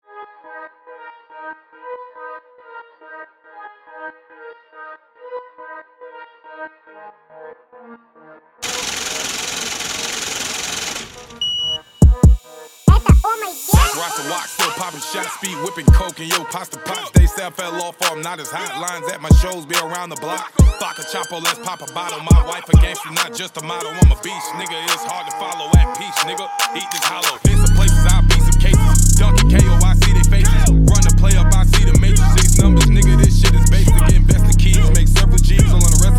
Trap beat remix

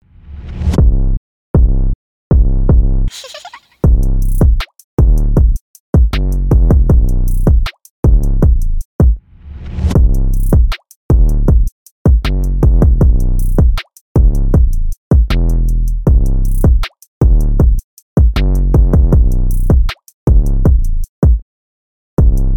Beat

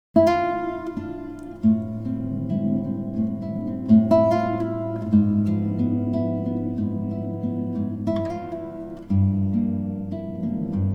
Interlude

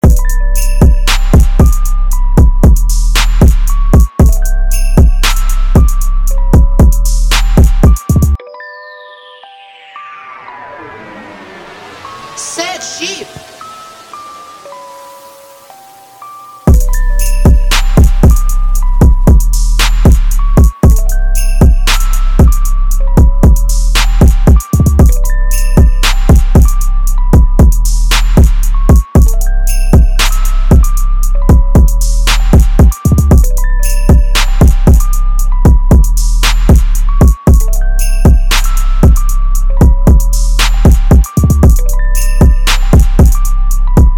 BEATS

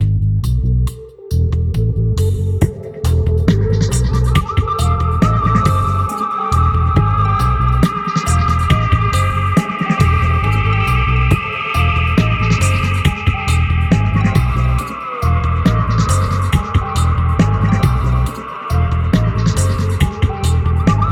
Dubstep

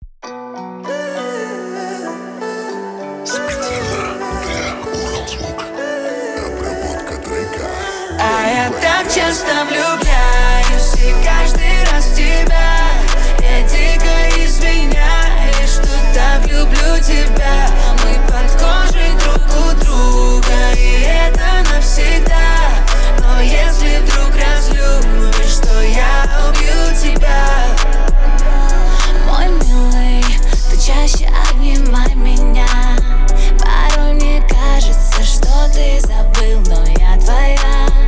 28-31hz Low Bass

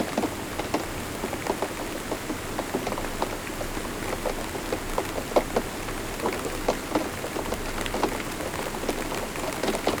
Traffic Rain; Relax Meditation Sleep

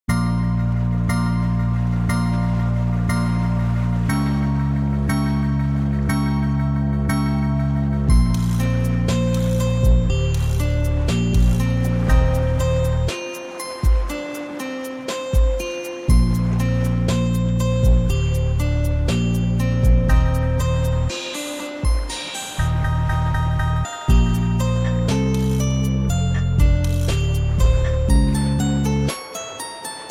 Lofi

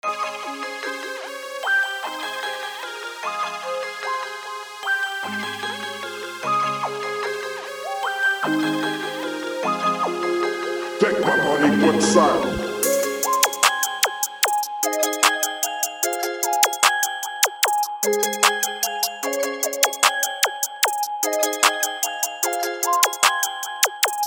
Trap 150bpm G Major

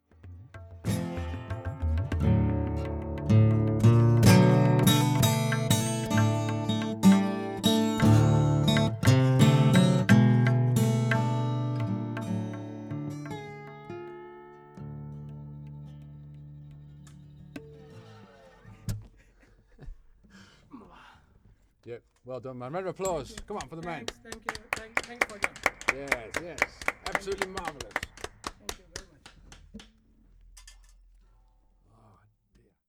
Tabla Jam

